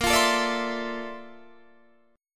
A sharp Major Sharp 11th, Guitar, DADGAD

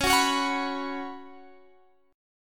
Db 7th Suspended 4th Db down Guitar Drop D

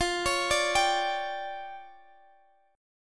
D Minor Major 11th, F

